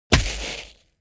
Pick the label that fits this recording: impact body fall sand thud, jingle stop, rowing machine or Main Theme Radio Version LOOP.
impact body fall sand thud